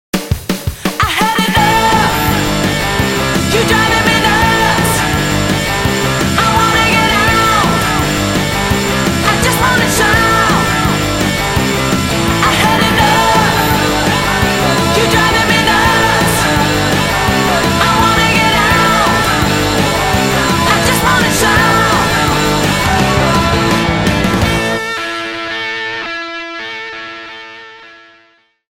Shout, Nokia Tones; Windows Phone apps

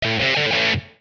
Punk slide close; Nokia; audio themes

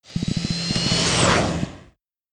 Stereo Aircraft, notifications; Motorola; Edge 70 Max China

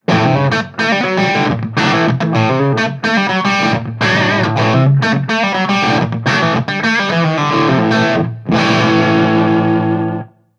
Classic Rock, ringtones; Marshall; London KB 1501

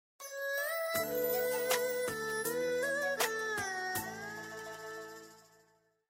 Message tone; Samsung GT-B7702 (IC)

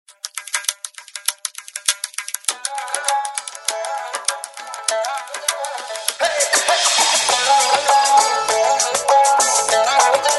JugalBandi; Samsung SGH-E251 India

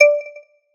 notification; Oppo; F3 Plus

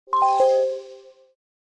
battery low; Nokia; N85; Audio themes

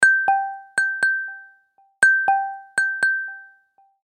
Droplet, ringtones; Samsung; Galaxy Watch 6

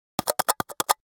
Message, Nokia Alerts; Windows Phone apps

